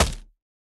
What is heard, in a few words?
Crossbow Impact; Going Under